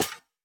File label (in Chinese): 刷怪笼踩踏声